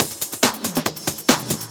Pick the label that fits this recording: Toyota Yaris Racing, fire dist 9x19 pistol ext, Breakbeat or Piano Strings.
Breakbeat